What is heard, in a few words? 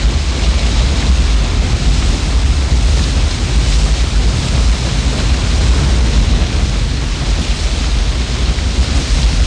waterfall